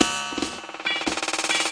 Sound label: 140 breakfast roll, drum loops and breaks